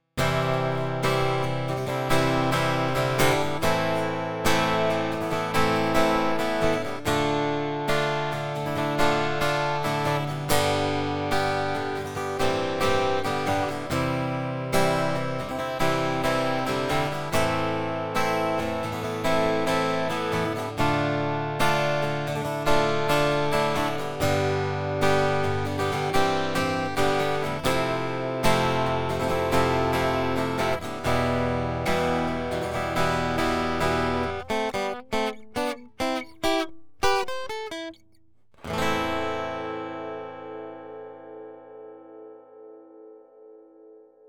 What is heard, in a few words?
Takamine FT340 BS Pickup Strumming